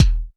BD, Bassdrums, Roland TD-20

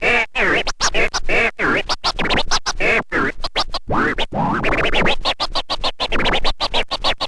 scratch med, Vinyl Attack scratches mix; Masterbits

